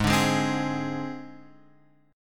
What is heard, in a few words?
G sharp Major Flat 5th, chord; G#Mb5 Chord {4 5 6 5 X 4} strummed